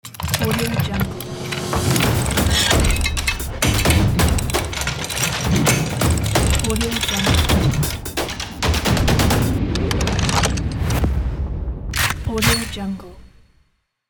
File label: cybersport logo opener